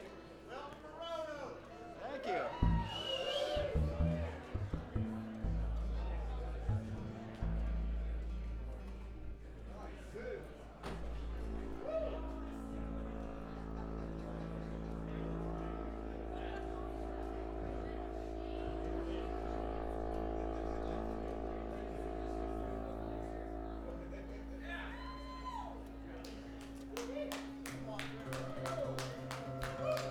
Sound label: Tuning, Bluegrass; Welcome to Roanoke; mtx